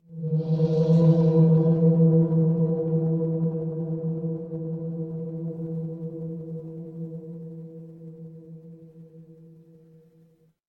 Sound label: horror creak